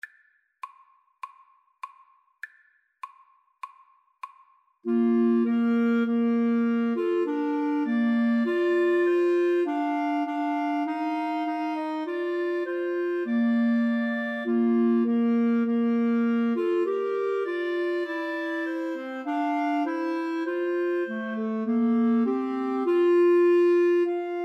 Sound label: Clarinet trio